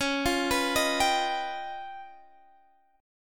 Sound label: Db 7th Sharp 11th; Db7#11 arpeggiated; JGuitar